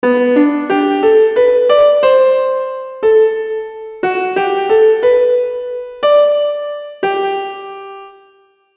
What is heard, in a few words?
melodic lines 6 8 4 bars